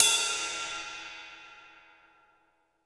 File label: Rock Ride, cymbals; Roland BK-5